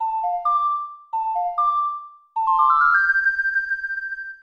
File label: Ocarina